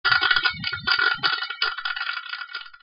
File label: popcorn popping